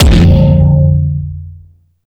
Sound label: DUTCH KICK; Masterbits; Soniq Elements